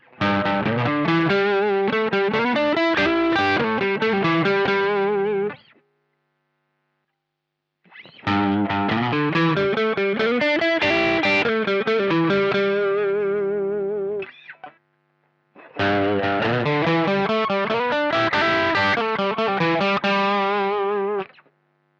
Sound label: P90 Crunch Lead